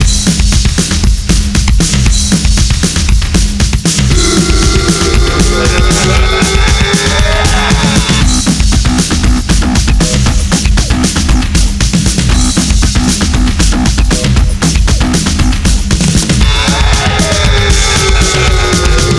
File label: drum